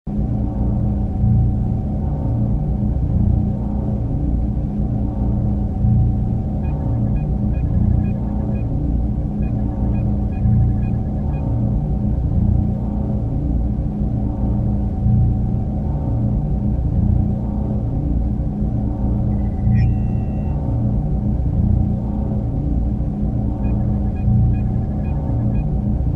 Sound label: Engineering Warp Core Background Ambience; Star Trek Voyager